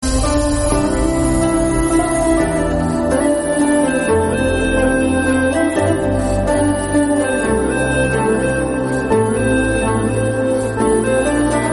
emotional heart touching music tone ringtone; english ringtones

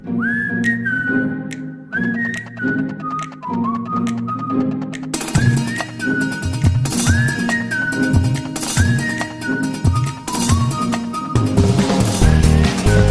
castle theme ringtone

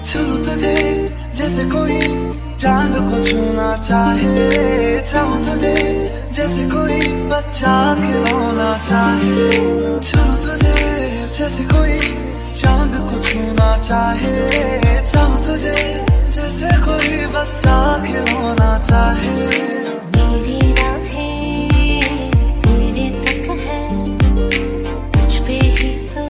sweet music ringtone, bollywood ringtones

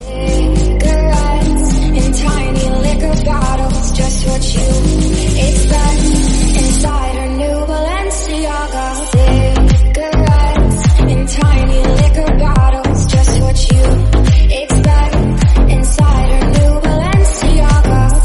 soft famous English songs phone tone ringtone

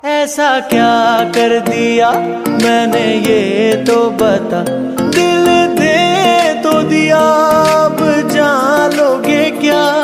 music ringtone

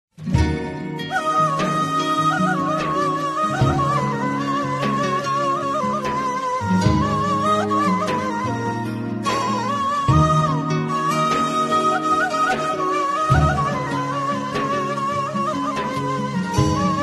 sad flute ringtone

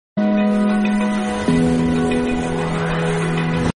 lovely music message tone ringtone

